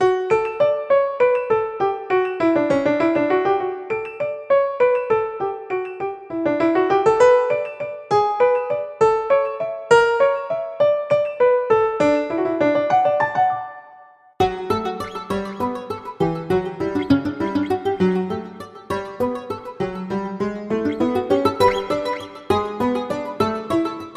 Classical; LeEco; Le-2

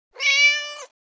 Mew, notifications

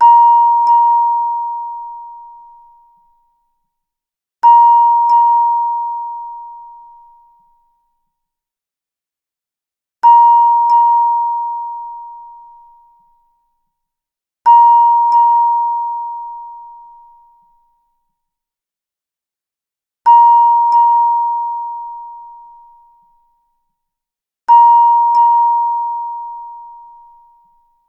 Tuning Fork, ringtones

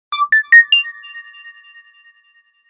notification; Infinix; A88

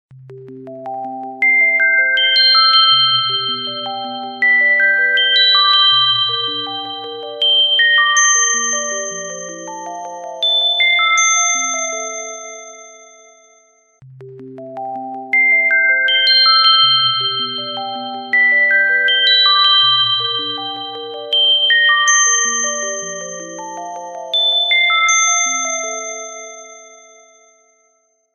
Crystal Box, ringtones; Coolpad

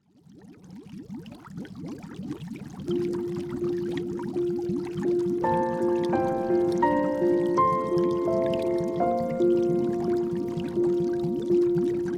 Stream; Explay; Neo

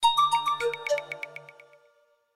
Pan Flute, notifications; Coolpad 1827

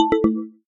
Sync, notifications; Lenovo; ZUI 2

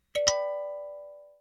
Flower seed, notifications; Samsung; Galaxy-S4-mini-LaFleur

